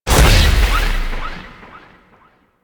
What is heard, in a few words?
Chaos End; Warframe; Nyx; Nyx Updated Sounds